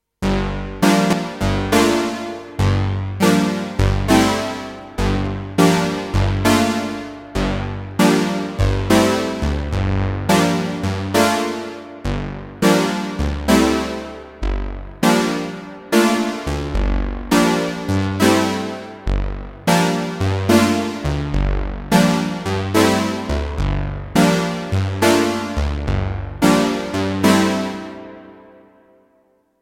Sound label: Fat Chords